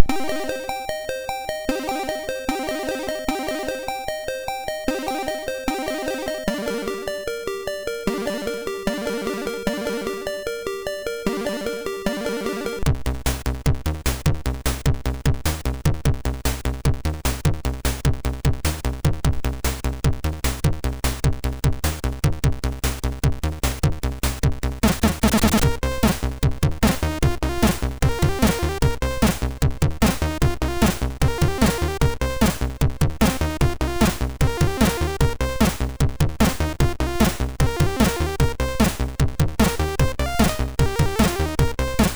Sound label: Improvisation, MOS6581R2